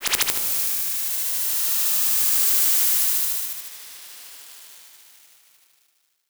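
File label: synth, Production Effects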